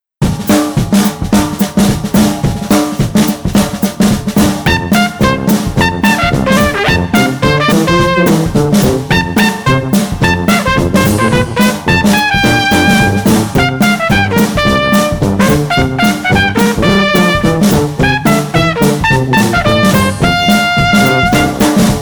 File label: Mardi Gras Festivities no Clarinet